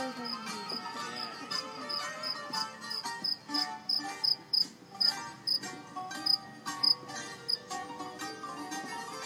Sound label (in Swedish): Cikador i Larnaca